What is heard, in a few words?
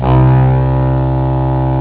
heavy Saxophone; ST-XX Amiga Soundtracker Sample Packs